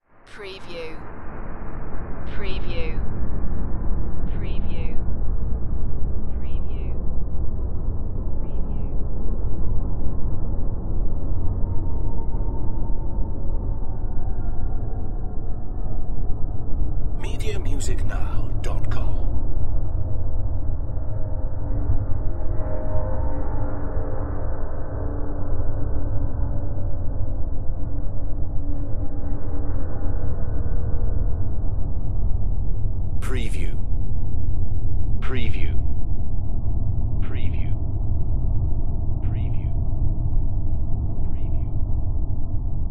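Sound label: Production Element Bed Dark; Sound Effects Bible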